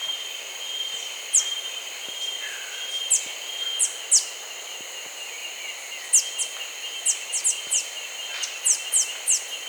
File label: yellow backed tanager